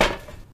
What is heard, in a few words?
metal land; Golden Crayon Sounds